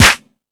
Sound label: Dance, Drum Machines